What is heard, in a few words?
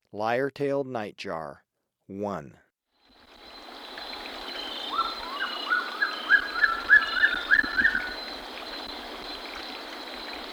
Lyre-tailed nightjar; S. America